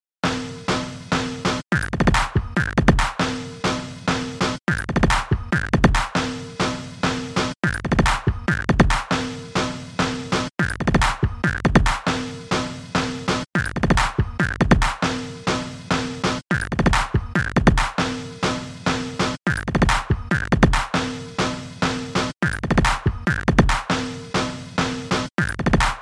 Dirge; Splatoon 3; Salmon Run

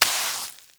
Fx Paint; Levels Hub Orleans; Draw Wallpaint; Fx Paint Swipe

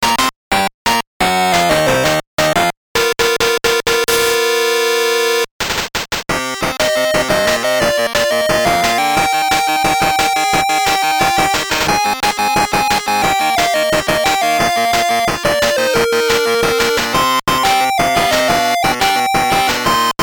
BGM, WonderSwan Color; battle spirit digimon frontier

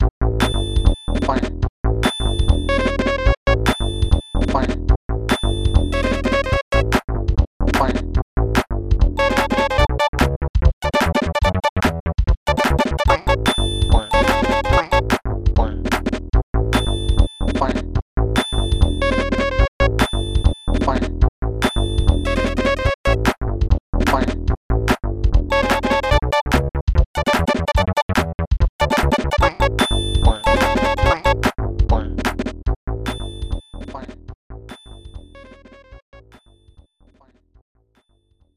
High Score; Q*bert 3; SNES

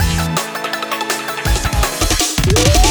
Transition; sky rogue

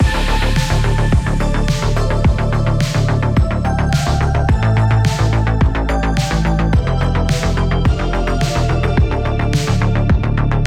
synthwave; Jericho Junction; WrestleQuest

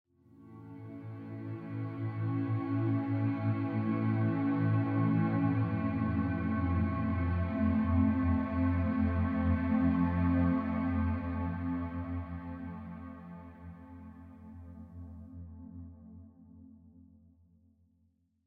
Explore Day Pad chords

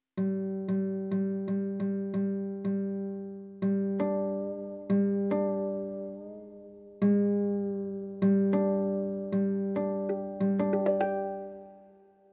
harper tuning up; Dreamcast; gamerip; dragon riders chronicles of pern

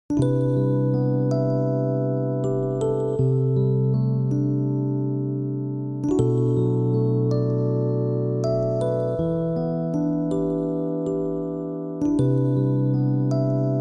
Event Sad; Gun Gun Pixies; PS Vita

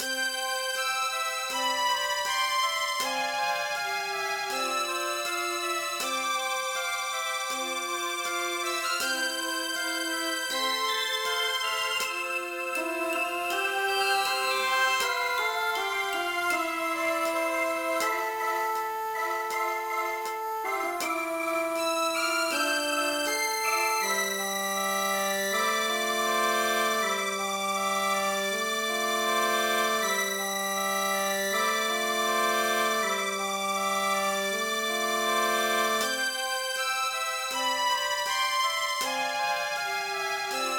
BGM; Croket DS Tenkuu no Yuusha tachi

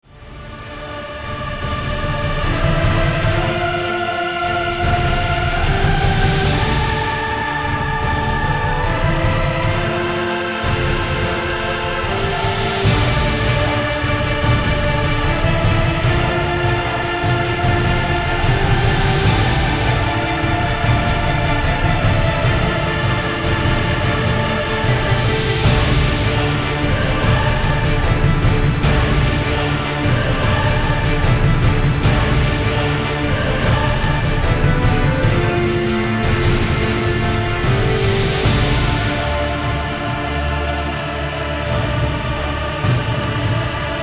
Music Elite

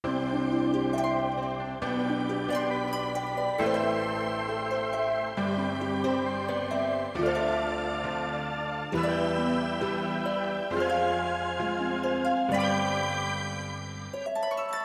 bgm op short inst; Happiness Sakura Celebration

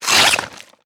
Sword Attack Hit; Fishmen; We Are the Dwarves

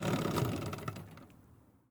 vehicle woodboat turnoff

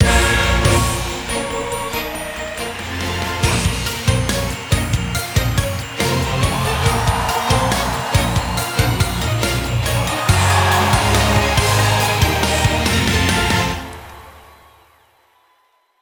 Mission Victory music and sfx

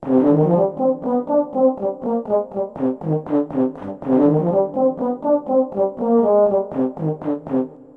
Tuba; Smeshariki Chiko's Computer

